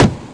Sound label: [Drum]; 音MAD; 少女终末旅行; 소녀 종말 여행 음원팩